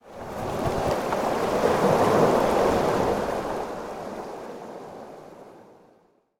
waves; ice age scrats nutty adventure; Level2 Ice Fields

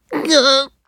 weird effort; ice age scrats nutty adventure; Nut Boarding

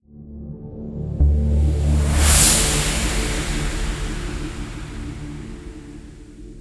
Transition

